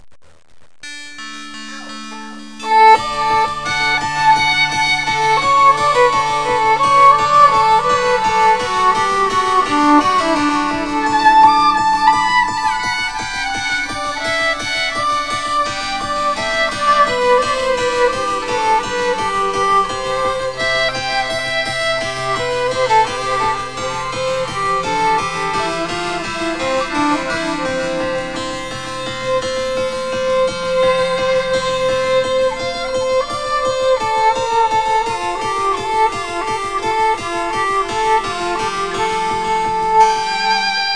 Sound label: violin